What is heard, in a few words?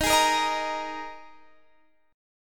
D sharp Suspended 4th; 23,23,20,20,23,x; strummed